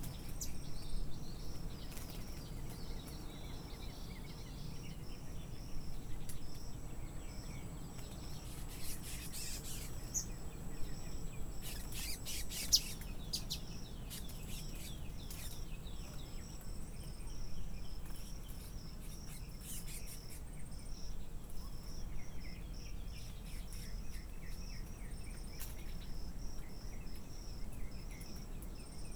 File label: Birdsong